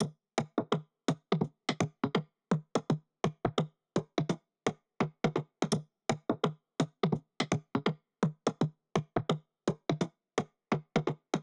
Percs 168bpm

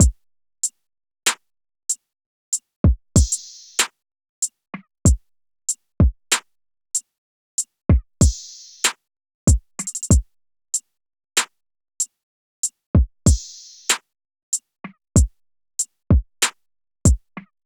Dreams Drums 95bpm

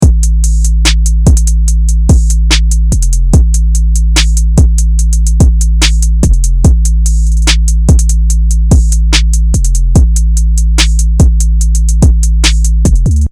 Trap Drum Kit 808 Beefy; trap vst

